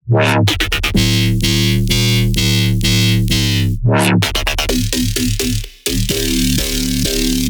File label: House Drop Loop - Bass House - 128 BPM D Min; Synths